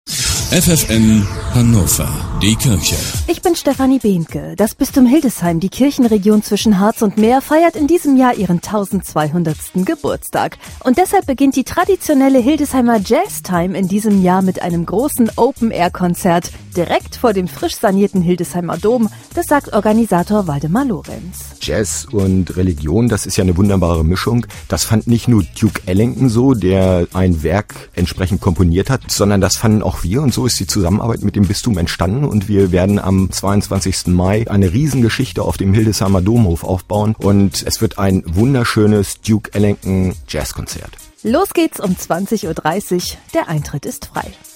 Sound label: sacred jazz; hildesheimer jazztime startet auf domhof